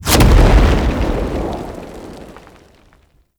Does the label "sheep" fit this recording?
No